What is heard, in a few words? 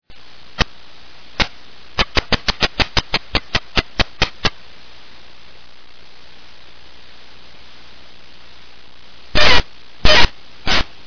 turkey cackle